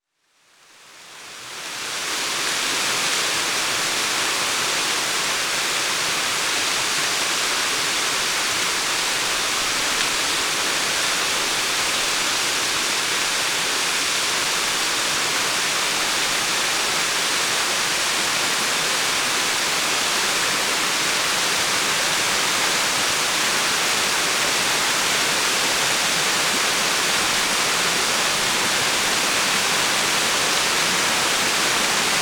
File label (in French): La cascade du Ray Pic; Ardeche; Ambiances Sonores d'Ardeche; Studio Les Trois Becs